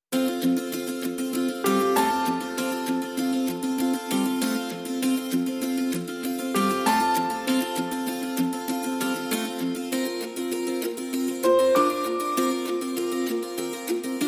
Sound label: No Violin No Bells Mix